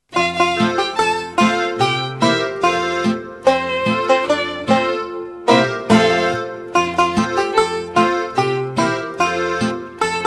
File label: music only; youve had a birthday; italian; childrens songbook